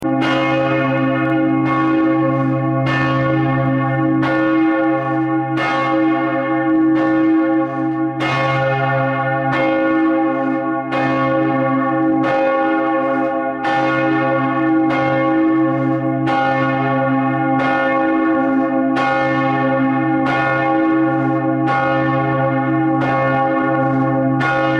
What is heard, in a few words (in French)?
cloche